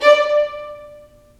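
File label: violins marcato, String Sections; Roland LCDP13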